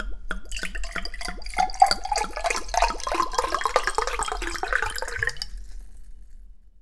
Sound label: POUR OUT, household; AKAI Anime Sound FX